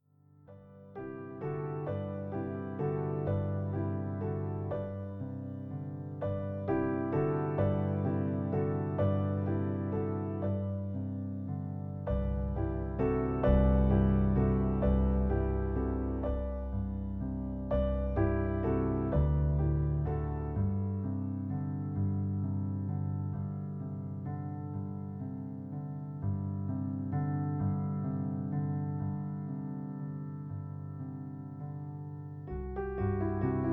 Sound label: piano